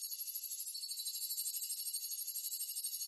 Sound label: anticipation loop; Recode; rampage riches Desktop